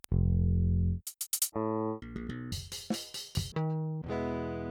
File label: Saturator; Virtual Analog